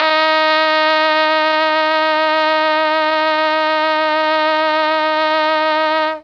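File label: D#3 trombone trumpet, Mellotron; Mike Pinder - Mellotron Archive CD; TROMB+TRMPT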